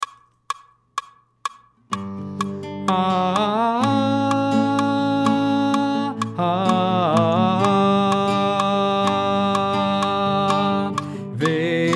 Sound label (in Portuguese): barítono